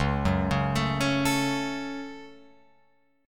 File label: Db Suspended 4th Sharp 5th, Guitar Open F; arpeggiated